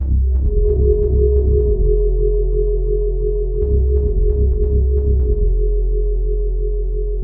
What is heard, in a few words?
Ambient 133bpm